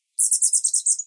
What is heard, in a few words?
hummingbird